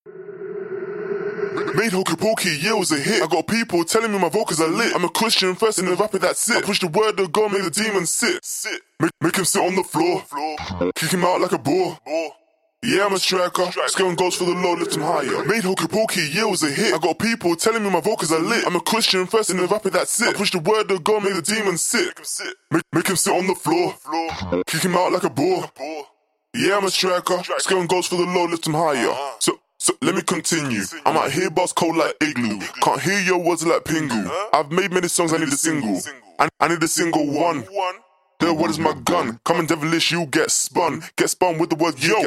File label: uk drill, 140bpm, key Gb Maj, Acapellas